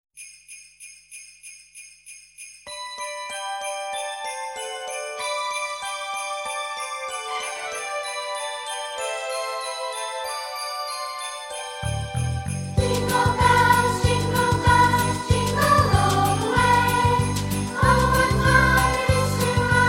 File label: CHRISTMAS Jingle Bells